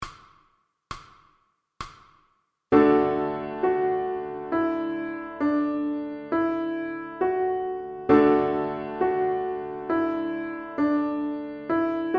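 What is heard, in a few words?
VLN Morning, piano accompaniments for violin; Grade 1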